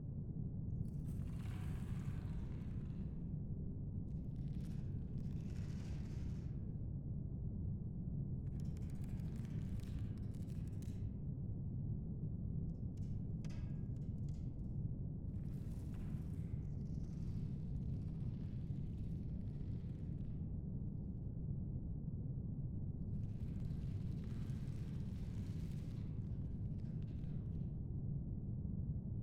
Ambient Bar Broken; Drifting Lands

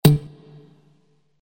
Percussive error; WeMod

